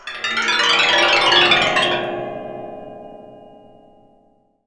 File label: waterphone